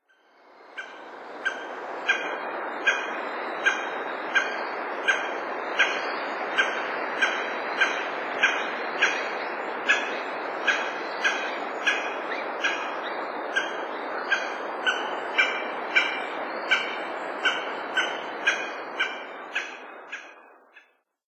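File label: Japanese Green Woodpecker; aogera; utsunomiya; koe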